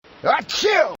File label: Sneeze Ah choo